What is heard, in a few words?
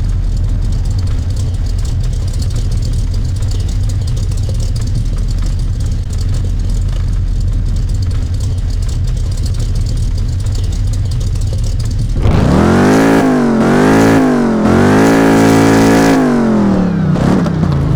idle, vehicles cars; lwcars